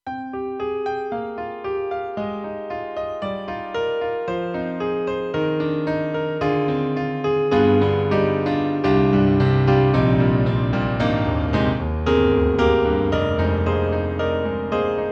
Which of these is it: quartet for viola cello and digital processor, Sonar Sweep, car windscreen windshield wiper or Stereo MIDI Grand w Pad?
Stereo MIDI Grand w Pad